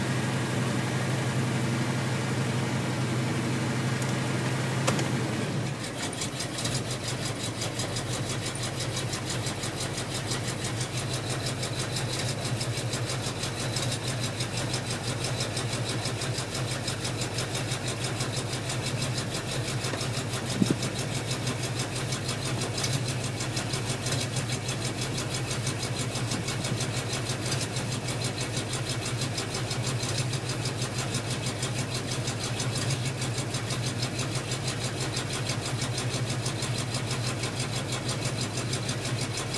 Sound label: truck